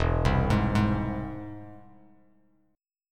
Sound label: E Augmented, Bass Standard; E+ arpeggiated; JGuitar